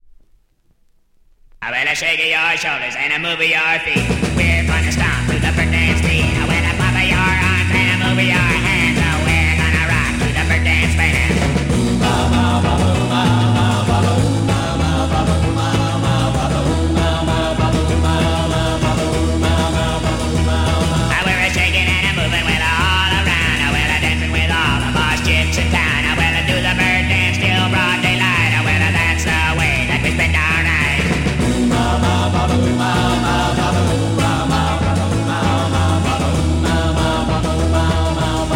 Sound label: bird dance beat